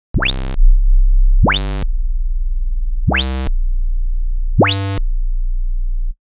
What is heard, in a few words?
Reso full Modular V3; SIGNAL FLOW PART 2 EDIT; Arturia